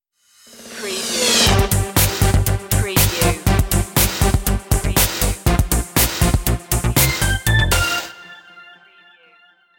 techno stinger

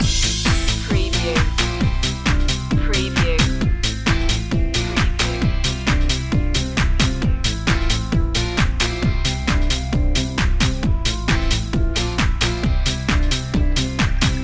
rock the house loop

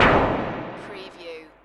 Space Scifi Sound - white blast

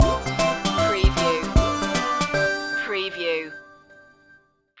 retro video game pipe world stinger

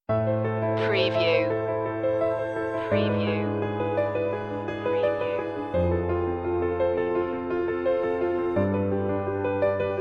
never forgotten no drums

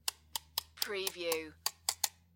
paper knife, tap blade

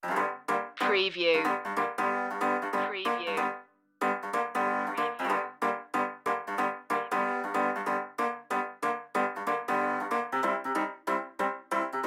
piano only

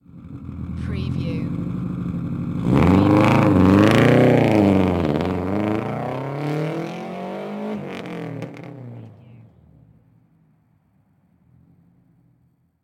SUBARU STI EXHAUST TAKEOFF

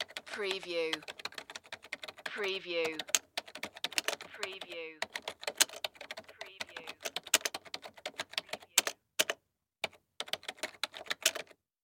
Computer Keyboard

